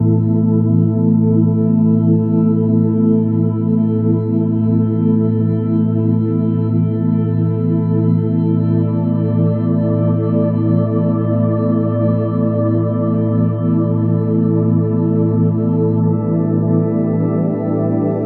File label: Meditation Music Background; melodyloops